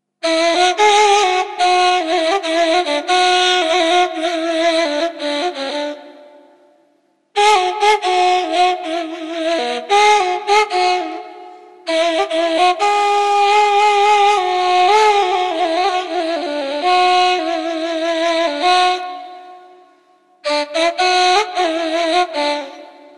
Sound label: music instrumental ney